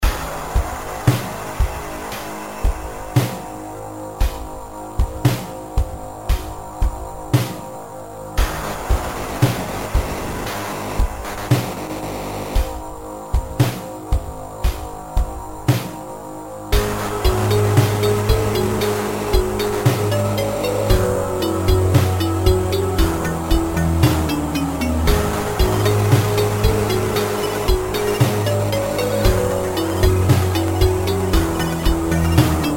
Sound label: Vault Menu